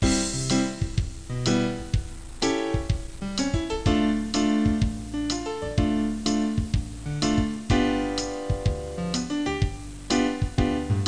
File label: Music; Bible Fun Pack; Education Publishing Concepts; NOAH